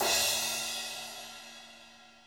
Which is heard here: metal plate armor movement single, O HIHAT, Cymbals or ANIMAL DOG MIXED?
Cymbals